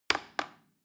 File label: plastic button little click regular slow